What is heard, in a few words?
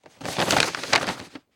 Foley Paper Unroll